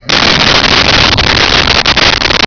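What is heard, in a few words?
voice growl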